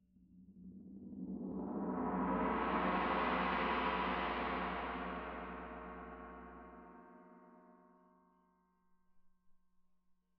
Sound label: Tam-Tam Main